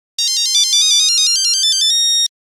Nokia Buzzer Going up; Windows Phone apps

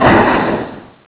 drop platform; Bounce Touch

